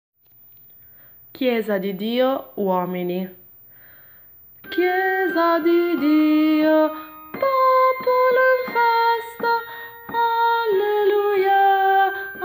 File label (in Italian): uomini; Chiesa di Dio